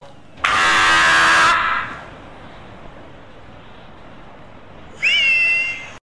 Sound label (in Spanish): sonido de aguila; danirep